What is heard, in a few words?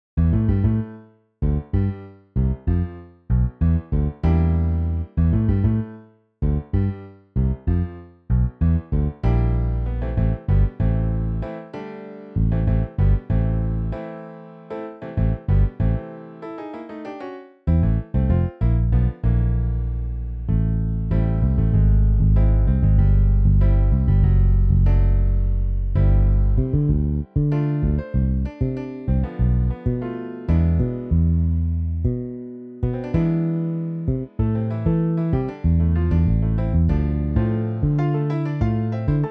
Piano Bass